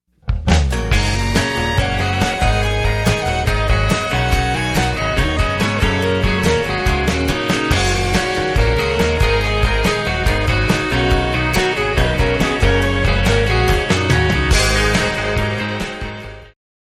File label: filter